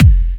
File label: Bassdrums UK Trance Kicks; Vengeance Samples